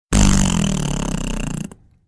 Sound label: fart; hl2dm